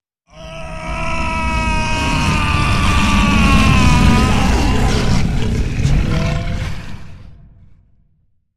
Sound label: Werewolf Turning Transition